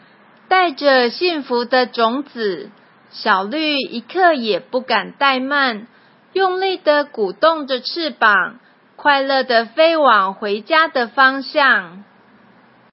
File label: music